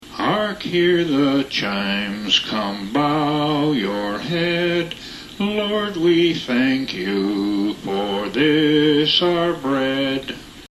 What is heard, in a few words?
Hark Hear the Chimes, camp songs, Graces